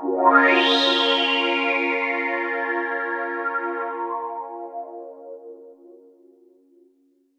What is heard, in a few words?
Old Skool Falls, Underground UK House & Electro, Pads; Sonic Foundry (Sony Creative Software)